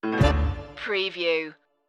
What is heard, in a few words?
tango de luna stinger